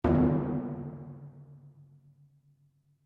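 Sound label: Drum Taiko forte, Japanese Taiko Drum; Miscellaneous Musical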